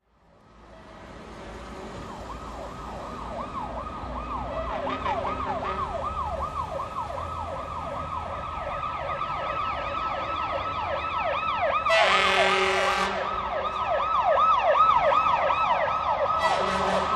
Fire truck siren and honking in distance

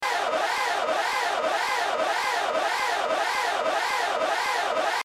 danger alarm

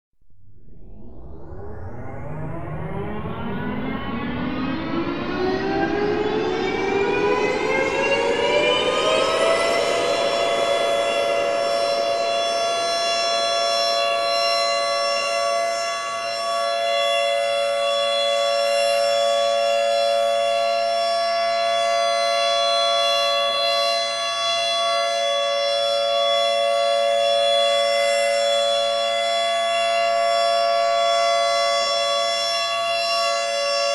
air raid siren

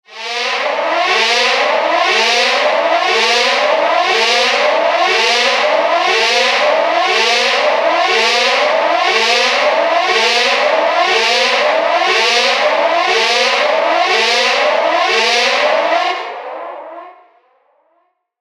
ship siren

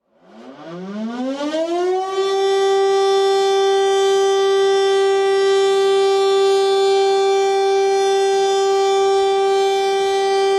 france eas alarm real

